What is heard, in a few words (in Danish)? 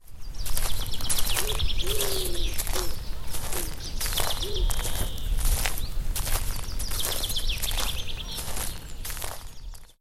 Skov; NATUR